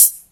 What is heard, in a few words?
airy closed hi hat sound d sharp; Mono; hat samples